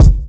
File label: clean steel kick drum sample g key